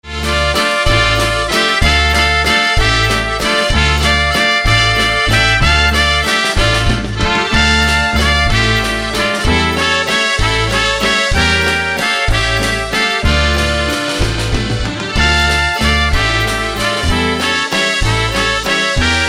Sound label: Oj Jej Jasienku Oberek